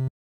Panel Upload Near; Among Us

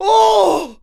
Male Scream

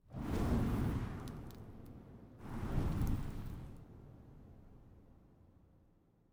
Brand Sound

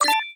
Chest Item Received; multiplayer kart racing; boom karts; android

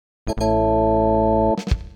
Reached Goal Area; Jumble Hoppen; Pilotwings 64; n64

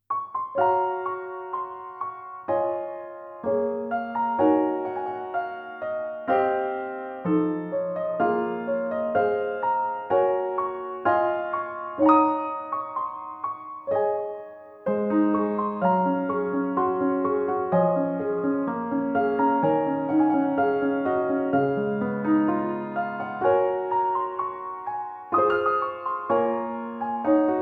Piano Solo; Nelke & the Legendary Alchemists: Ateliers of the New World

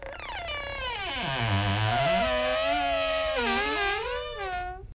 Door Creak; Effects; Scratch

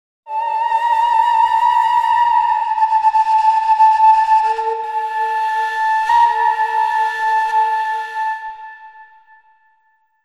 The Sound of the Golem Flute; Enchanted Arms

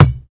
Ball Bounce; Angry Birds

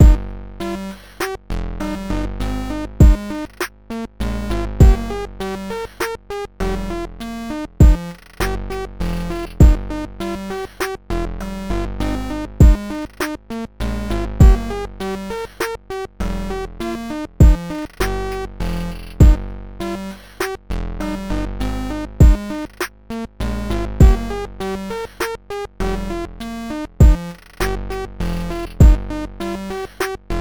Game Over; Pixel

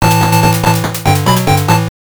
Stage Clear (Record Beaten); delta warp neo geo pocket color

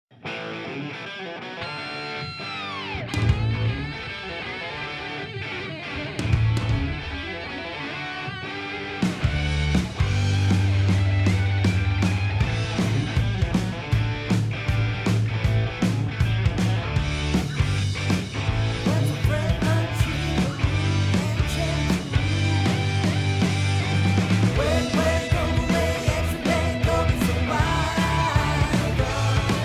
Karaoke Rock; The Sims 4 City Living; macOS; PS4; Windows; Xbox One; Xbox Series X/S

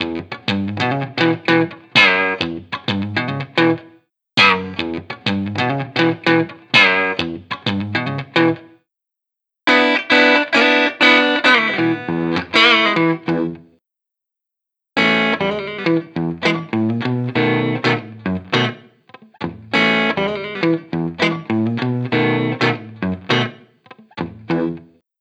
Guitar Lesson Non Effect; another code r a journey into lost memories wii

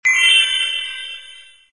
Coin Get

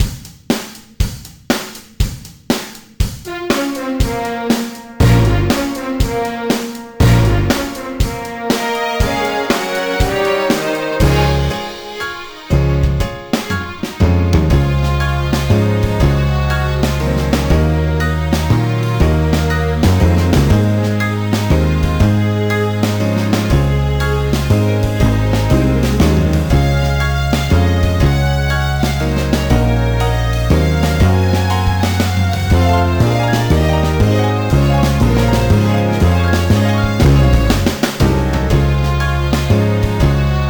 Piano Concerto; Gabrielle's Ghostly Groove 3D; Nintendo 3DS

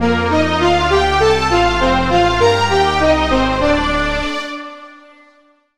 Item Fanfare; Another Metroid 2 Remake Project AM2R

